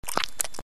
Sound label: Suck; Lewd SFX